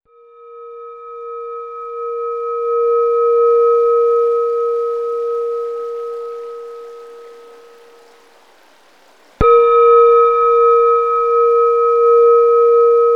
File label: Tibetan Singing Bowls